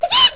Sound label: cluck, chicken